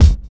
sharp kick d key, kick drum one shot tuned to the D note. Loudest frequency: 272Hz